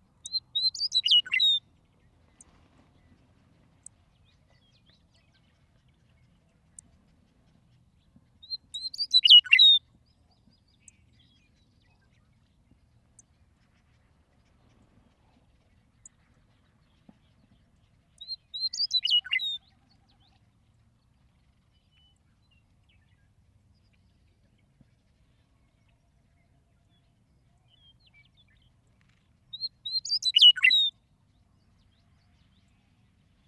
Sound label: Western Meadowlark